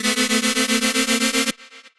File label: Ascend, effects; Cirrus; Ableton; Glitch and Wash